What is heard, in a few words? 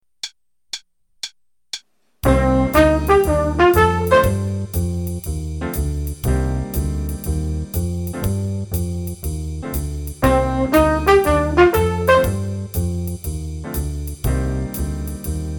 Improv Cmin with Jazz Rhythms (with trumpet)